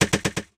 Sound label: ranger rapid fire; Adventures in Lestoria; action RPG game; Sig Productions